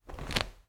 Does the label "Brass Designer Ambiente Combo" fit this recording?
No